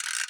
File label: winding